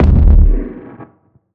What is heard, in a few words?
Impact